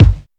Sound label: tr 707 kick sound g sharp key, Royality free tr 707 analog synth drum kick tuned to the G# note